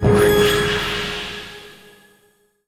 Level Up; portal quest android

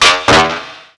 machine; Gonner

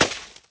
snowball damaged; Angry Birds Seasons

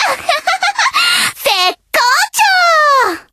voice ahahaha; moe pachinko; android game music

